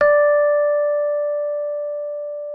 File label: MK1 D5, RHODES; Xsample VOL-1